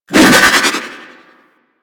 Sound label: Pet Spin Whoosh; Foley